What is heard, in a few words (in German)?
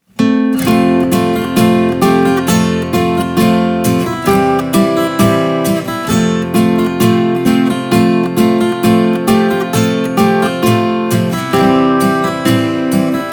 englisch lied karaoke; ich und du